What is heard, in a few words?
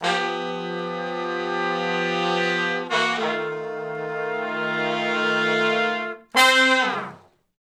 Slo Blues Chords (V, IV, I) (C) w falloff; Sections; Crimson Blue and Fabulous Horncraft 4 RnB; Horncraft for R&B